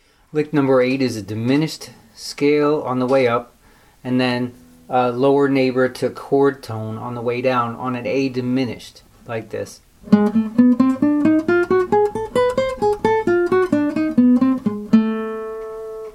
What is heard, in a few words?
jazz licks